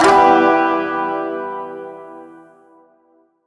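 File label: Shawzin Major Chord C; Chords; Warframe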